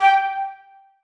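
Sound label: flutes staccato f#4; Sonatina Symphonic Orchestra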